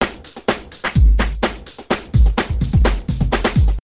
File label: funky breakbeat